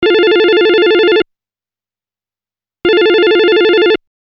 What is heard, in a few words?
phone